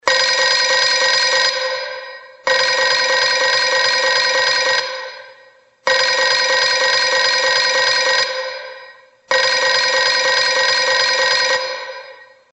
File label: bell